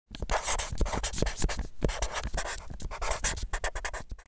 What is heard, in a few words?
Permanent marker writing paper surface short